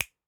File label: finger snap foley